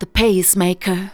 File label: vox, Techno Trance; Ueberschall